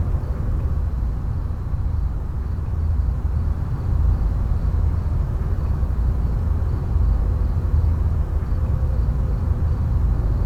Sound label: amb night city; midnight guns